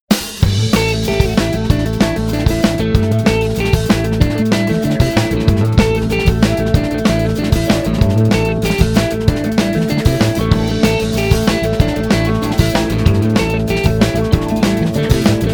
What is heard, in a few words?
pulse